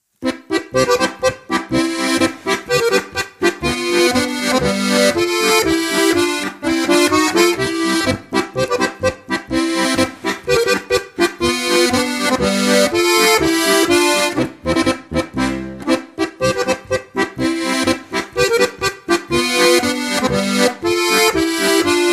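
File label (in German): harmonisch diatonisch, Hörprobe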